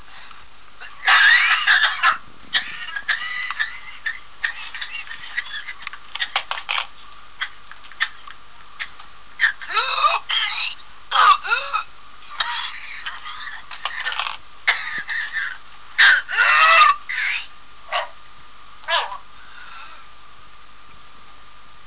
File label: wc adult